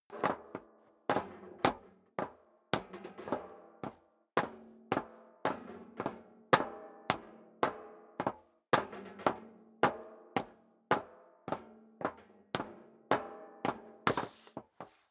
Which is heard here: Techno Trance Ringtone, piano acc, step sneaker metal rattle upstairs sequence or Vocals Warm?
step sneaker metal rattle upstairs sequence